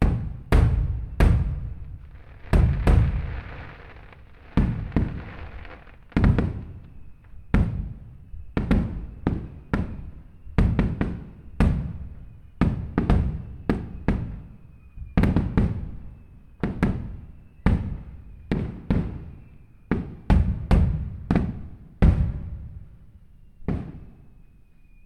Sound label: Fireworks; LOOPERS